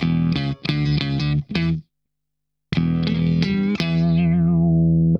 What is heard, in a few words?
SHOT, Funk Guitar; 90 s Sample CDs; Zero G